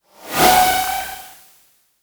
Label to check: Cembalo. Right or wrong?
Wrong